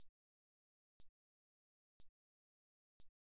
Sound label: Closed Hat; hydrogen